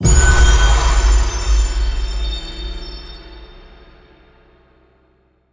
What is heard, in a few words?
generic Hit Big; netent